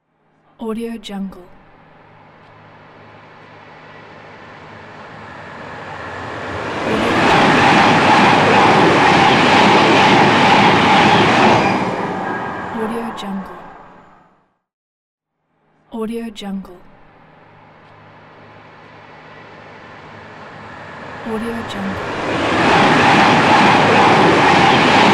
high speed train

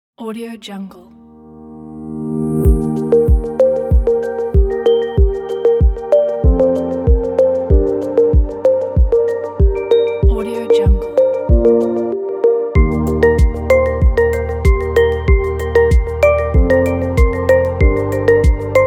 soft minimal ambient pack

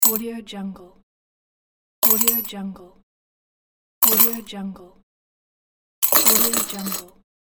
metal tip jar

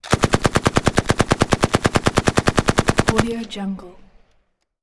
gun pkm combo; pov

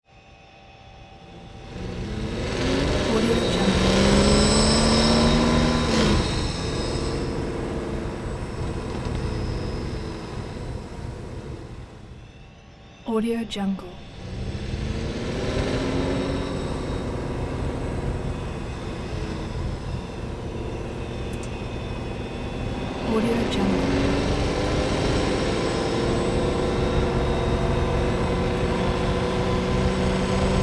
space vehicle sci fi combustion engine idle; prosoundfx

